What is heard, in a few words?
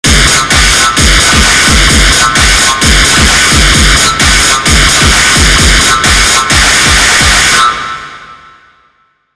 ultra super bass boost drums